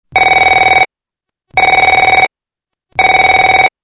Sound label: phone